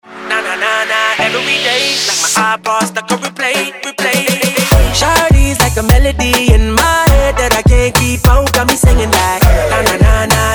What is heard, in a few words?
BEAT